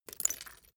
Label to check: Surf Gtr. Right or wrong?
Wrong